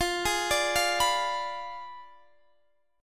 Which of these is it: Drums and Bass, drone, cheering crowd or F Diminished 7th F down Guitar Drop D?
F Diminished 7th F down Guitar Drop D